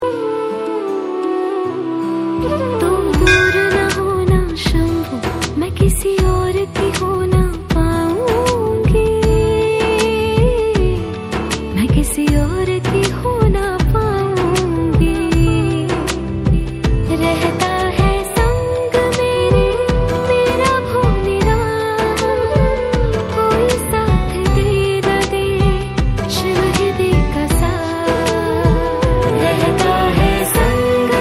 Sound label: Female version